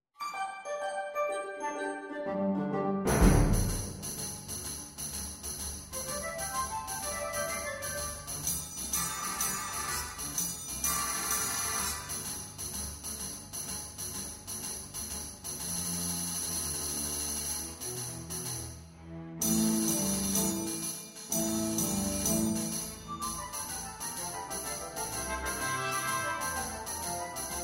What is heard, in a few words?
Ode orch accomp; wordsworth